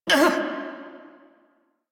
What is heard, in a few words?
Pain, Male; Railjack Crew; Perrin Sequence